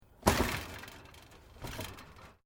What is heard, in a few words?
Door Wooden Gate Old Slam